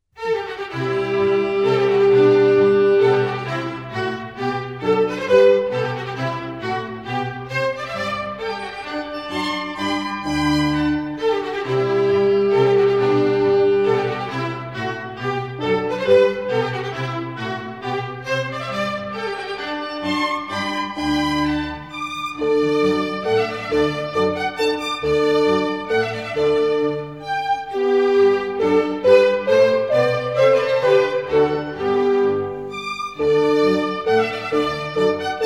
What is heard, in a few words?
Minuets, G Major; Mozart; Dances and Marches